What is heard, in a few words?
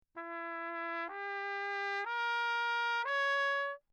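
trumpet mute; Crown; Perinétventiltrompete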